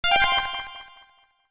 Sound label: whatsapp ALERT, tonos y notificaciones